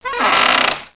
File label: Creak